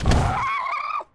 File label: spider soldier open